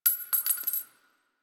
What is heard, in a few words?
AK47 Shell Casing; Warframe; Tenno; Weapons